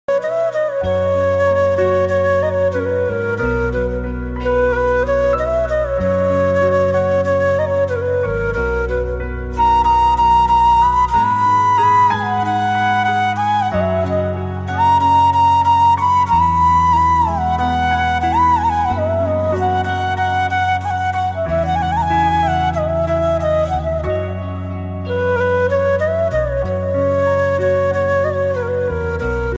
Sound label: bansuri ringtone flute ringtone flute instrumental